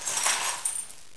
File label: Ice Monster